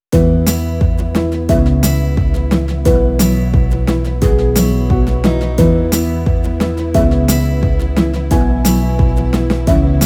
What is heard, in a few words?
Warm Acoustic Beats